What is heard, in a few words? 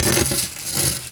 phasing metal; Sound Design Tools; Raw Foley